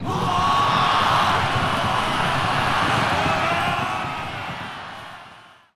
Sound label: people attack